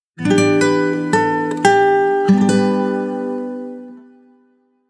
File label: acoustic guitar logo